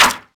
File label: wood hit, bullet hit; projectiles; Max Payne